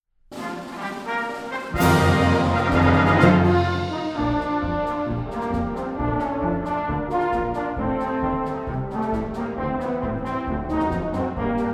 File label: Brass Band